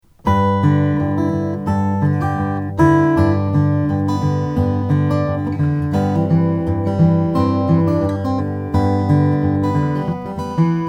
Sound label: doerr signature series sol brazilian rosewood